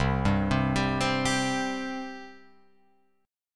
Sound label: C 5th C down Guitar Open F; C5 arpeggiated